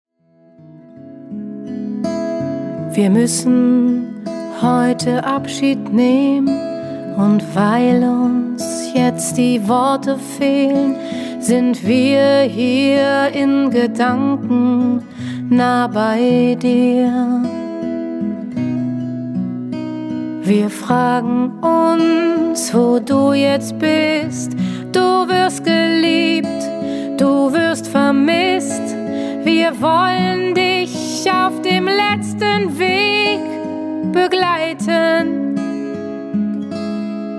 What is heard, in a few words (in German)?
gitarre probe